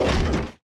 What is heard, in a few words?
thruster mechanic stop; platform thruster; Factorio